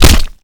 Projectile Sounds; Red Orchestra 41-45

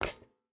footstep hide metal walk; Rust

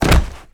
bodyfall wood

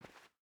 footstep barefoot cloth walk; Rust

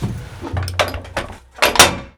Vehicle reloads; Red Orchestra Ostfront 41-45

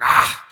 vce get up

